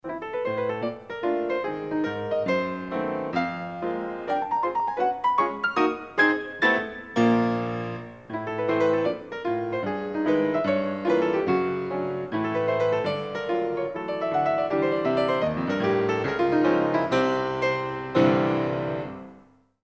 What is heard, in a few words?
sonatine, keyboard